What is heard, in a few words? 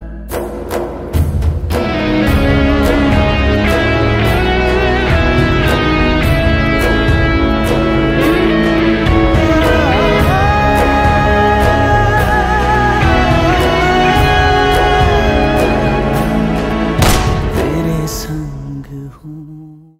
Instrumental Tone; Bidyut Jammwal; Khuda Hafiz Movie